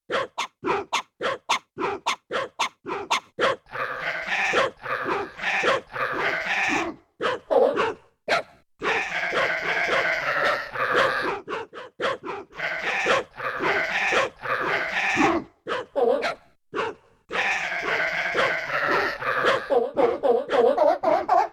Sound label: Animal Singer, Animal FX; Yamaha TX16W